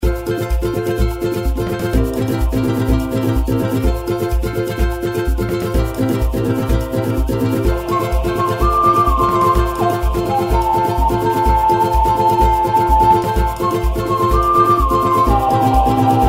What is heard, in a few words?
polynesian reelspin bg